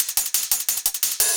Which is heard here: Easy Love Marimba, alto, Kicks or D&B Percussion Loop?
D&B Percussion Loop